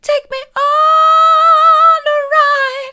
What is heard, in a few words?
take me on a ride mid, vocal